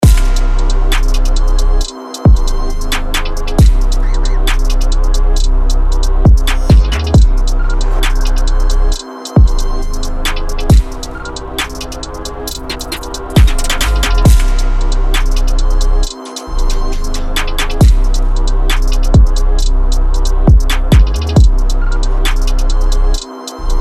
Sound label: Drill